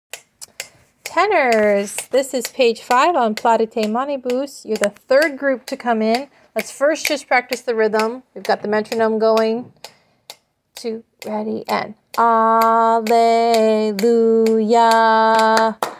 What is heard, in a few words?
Tenor plaudete; CHAMBER Choir